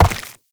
Step Flesh; Warframe; Uriel

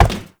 Step Metal; Warframe; Uriel

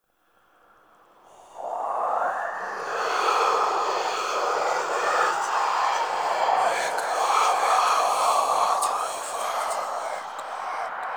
Whisper Blood Enemy; Warframe; Ambience; Entrati

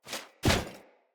Foley Heal Start; Enemy; Sinecure; Banneret

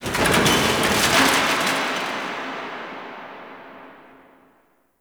Stadium Metal Crash; Warframe; Ambience; Nineteen Ninety Nine

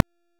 Exalted Sniper Cast; Warframe; Frumentarius

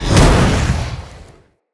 Casting Device Cast Heavy; Weapons; Player; Fenn; Casting Devices

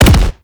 Explosion Punch Generic Extra Large; Explosion Punch Layers; Weapons

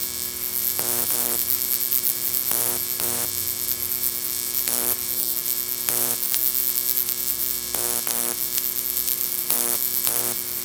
Light Flicker Loop; Ambience; Props; Warframe; TheNewWar; Zariman

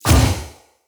Ghost Flesh Impact; Weapon Impacts

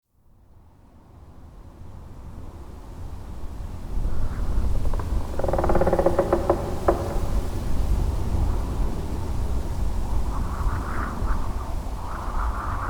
Root Cave Skylight Large Wind Gust; Ambience; Props; Neathuns Root Cave

